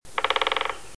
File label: greater spotted